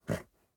horse grunt

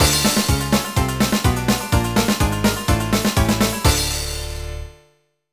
Sting sad; Wacky Races Crash Dash

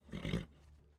horse grunt; teardown; ps5 windows xbox series xs

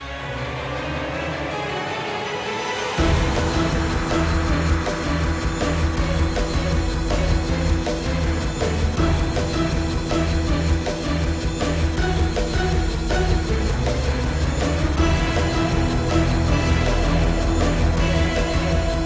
bgm boss; sword of the necromancer

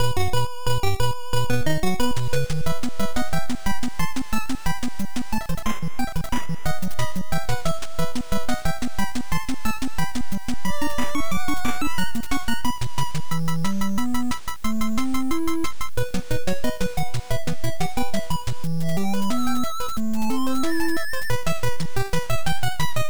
VS Music; Yoshi's Cookie; NES; Type C

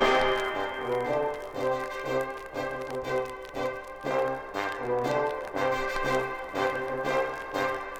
Newsreel Vinyl Loop 4 bar 120 bpm

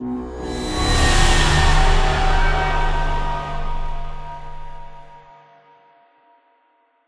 JINGLE DEATH; prodigy tactics windows gamerip 2018

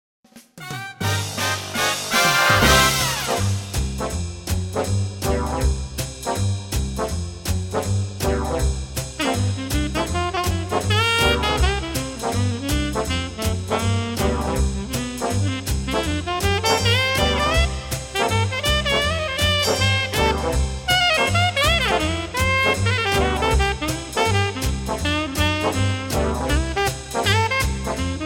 AMB MUSIC BASEMENT; World Championship Poker 2 featuring Howard Lederer; PSP

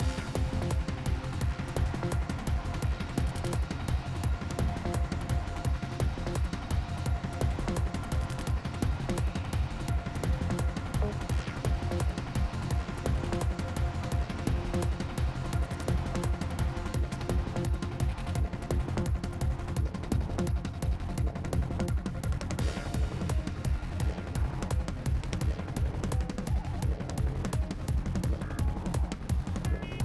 Chase 8CH 170BPM; Flytrap

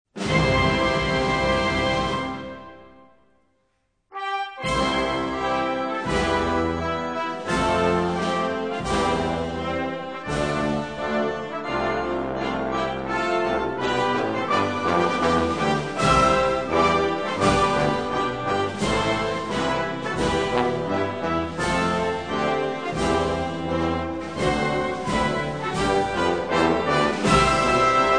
Soviet National Anthem

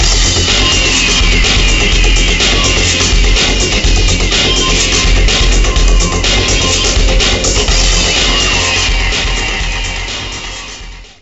Ditty; NBA ShootOut 2002; PS1

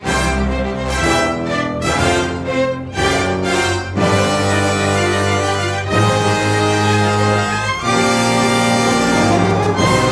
Major Win Fanfare; Regalia: Of Men and Monarchs

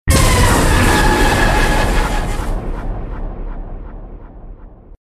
Player Death; Echo Prime

